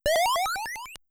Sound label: Healing; mother1